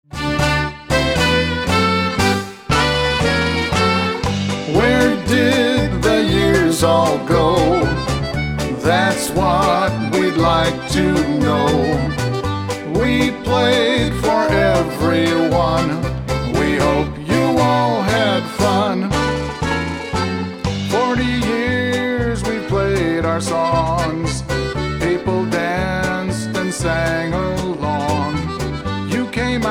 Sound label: Polka Medley